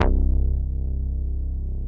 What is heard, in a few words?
BASS